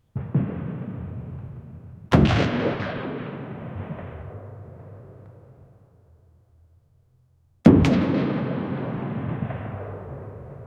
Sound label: warfare sounds in middle